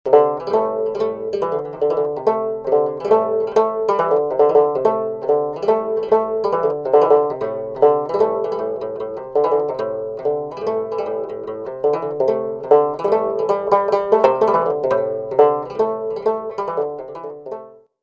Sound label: krar, musical instruments